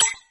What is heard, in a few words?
clunk